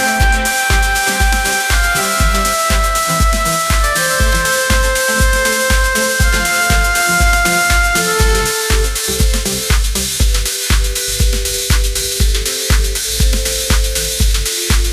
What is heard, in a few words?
interstellar cash flow groove, ringtones